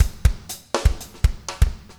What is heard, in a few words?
4 on floor 120, loops, 120bpm